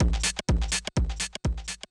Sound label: DRUM SHOT HOUSE 125; eJay; Singleshots